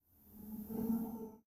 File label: ghost move; monsters